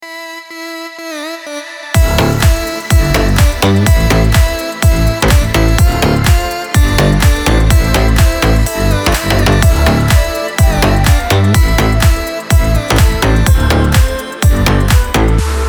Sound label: ELECTRO Remix